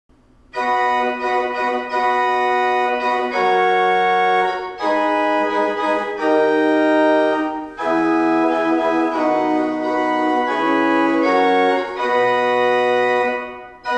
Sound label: Grob Gedackt Quintadena 8 und Principal 4, Tannenberg Organ; Gemeinhaus Saal - Old Salem, North Carolina; Grob Gedackt 8F., Quinta Dena 8F. und Principal 4F.